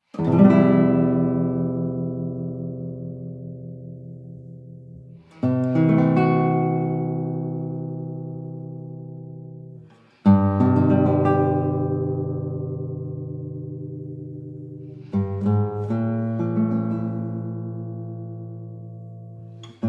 Detuned Guitar

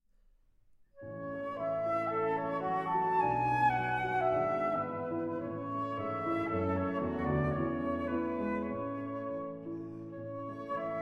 Oboe and Piano; Three Romances Op. 94; II. Einfach, Innig

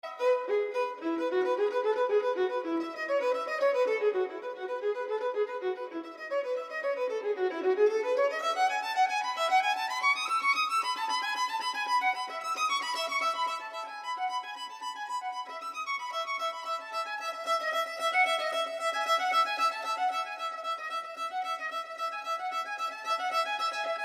Violin Partita in E Major, Prélude; No. 3; BWV 1006

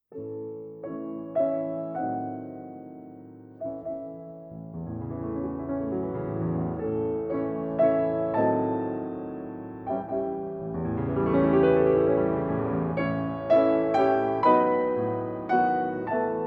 Valse des fleurs for Piano

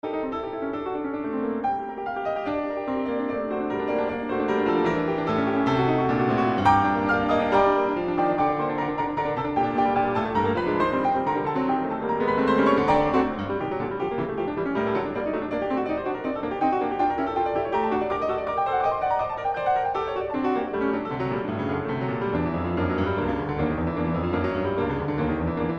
Piano Sonata in G Minor, So Rasch wie Moglich

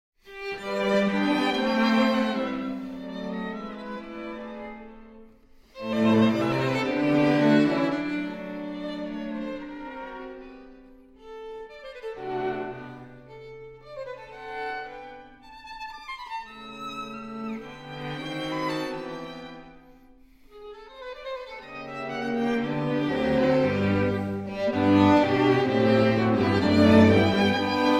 String Quartet

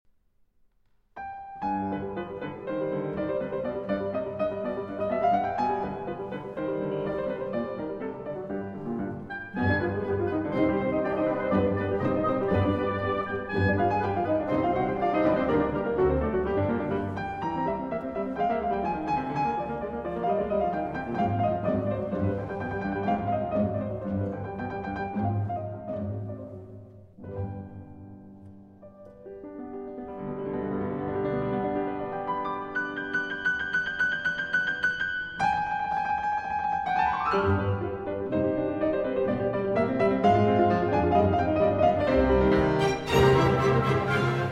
Piano Concerto in C Minor, Rondo. Allegro; Piano Concerto No. 3; Op. 37

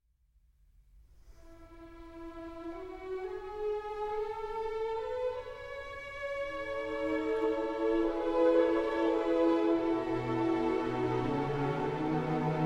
Piano Concerto No. 1 in E Minor II. Larghetto; Charles Dutoit; Op. 11

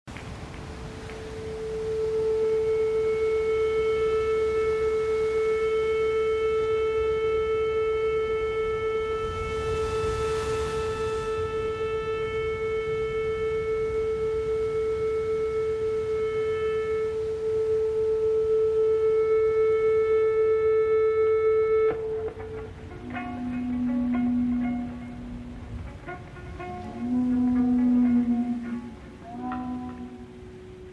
Guitar Solo

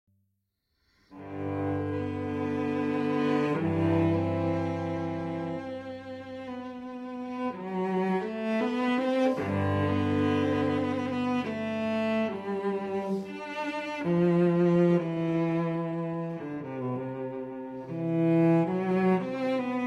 Cello Suite in G Major Sarabande; Cello Suite No. 1 in G Major, BWV 1007; IV. Sarabande

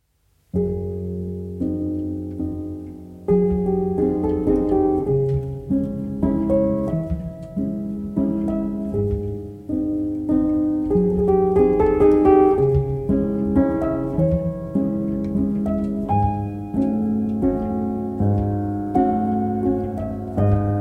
Piano Version

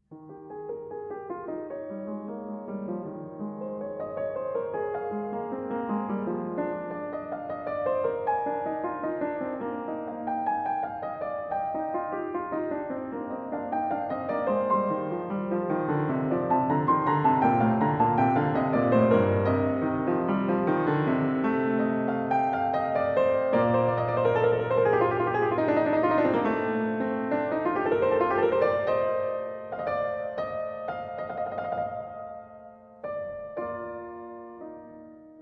Prelude in E-Flat Major; Prelude & Fugue in E-Flat Major, BWV 852

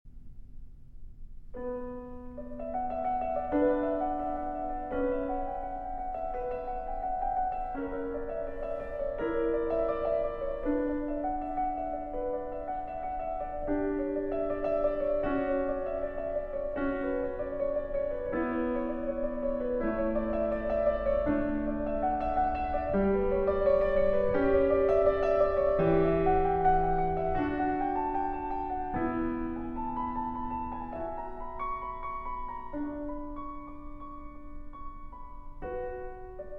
Prelude in E Minor; BWV 855a

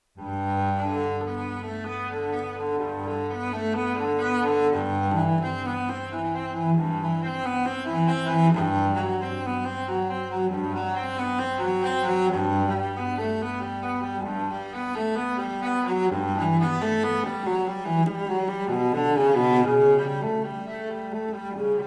Cello Suite No. 1 in G Major; BWV 1007; J.S. Bach

